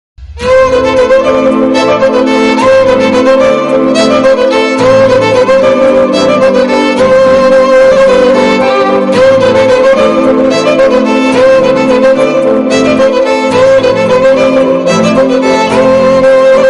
instrumental, guitar

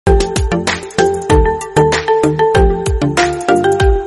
sound notification message

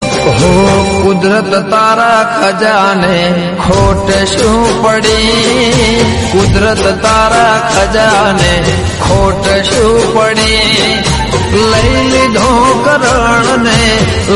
gujarati song

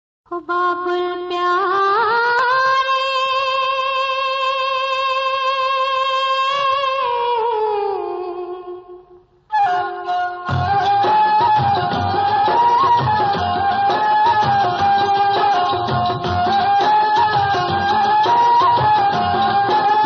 song

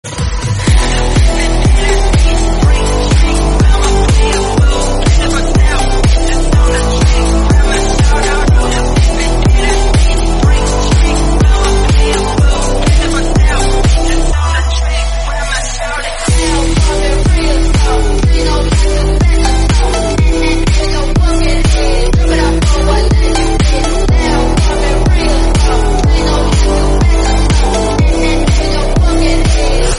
background music

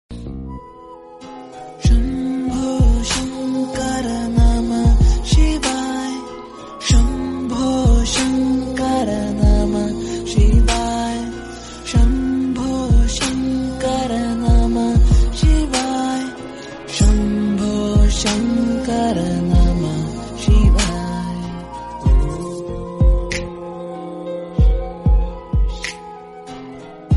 damru music instrumental; lord shiva

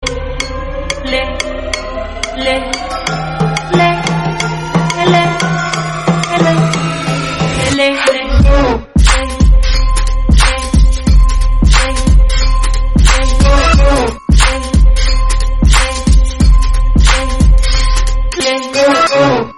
instrumental

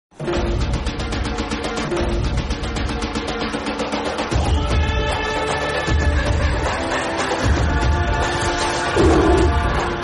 entry bgm; bheem

